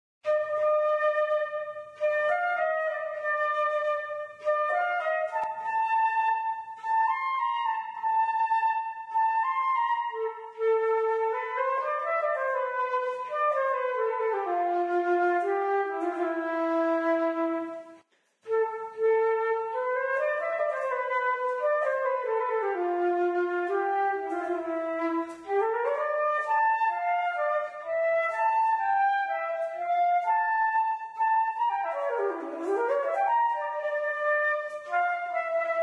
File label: Flute; Zomboid; Lifestyle